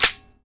cooled; weapons

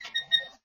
wheel

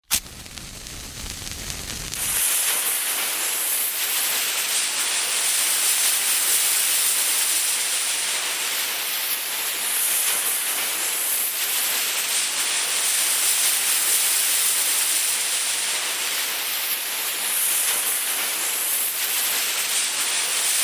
tnt fuse

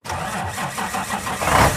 locostart

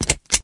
hold off; dice

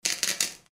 batt; vehicles

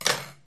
loco throttle

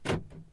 lock in; vehicles

